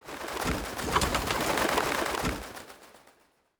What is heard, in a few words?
pigeons takeoff